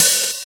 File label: muffled open high hat a sharp key, open hi hat sample